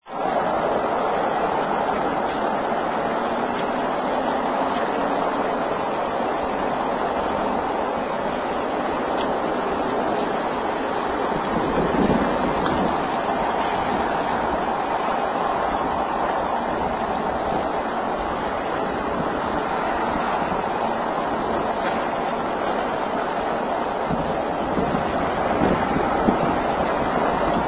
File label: Emergency Alert Horn Pattern